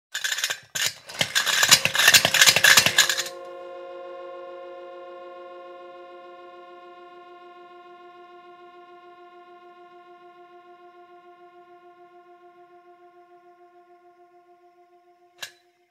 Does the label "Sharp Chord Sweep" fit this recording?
No